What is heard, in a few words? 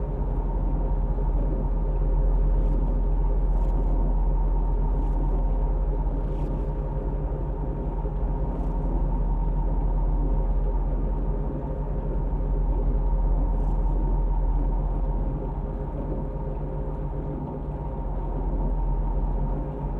ship idle; azaryn; Stellaris; paragon; ships